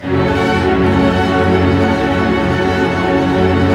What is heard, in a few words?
STR ARP MIN, Symphony Orchestra, STR Arpegg. Minor; Roland LCDP08 Symphony Orchestra; STR Arpeggios